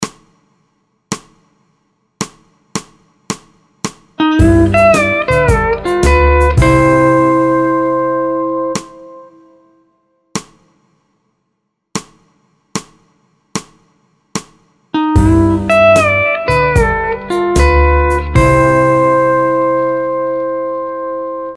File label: Key C Vamp 4 4